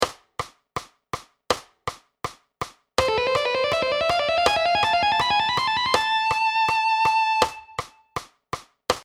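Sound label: Single String Sequence, guitar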